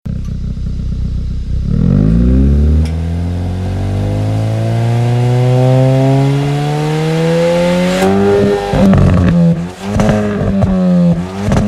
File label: Hyundai i20 N Sound